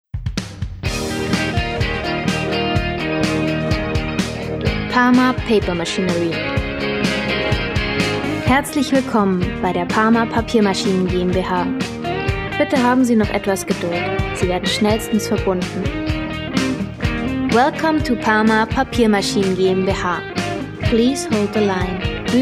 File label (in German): Telefon warteschleife